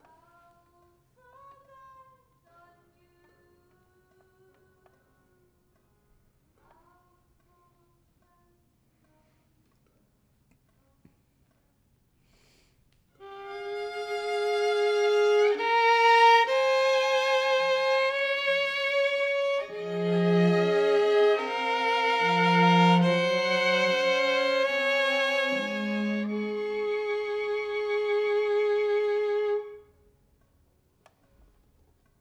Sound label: violin